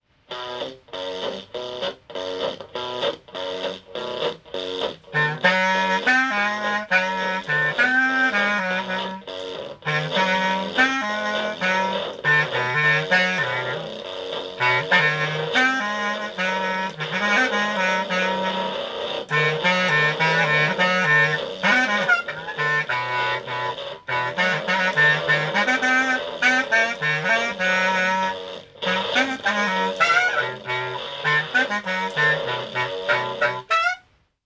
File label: DONKEY STOMP